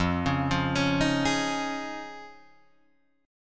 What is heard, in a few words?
F Minor 7th Sharp 5th, Guitar, DADGAD